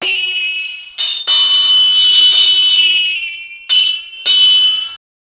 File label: epic ancient rome battlef, battlefield